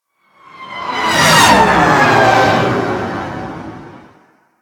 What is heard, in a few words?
Ship Close Fly By, Engines; Warframe; Vehicles; RailJack; RailJack Enemy Ships; Grineer; Engines Generic